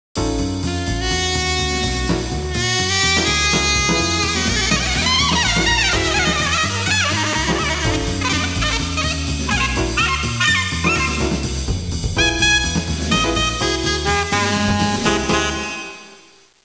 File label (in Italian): suonerie musica jazz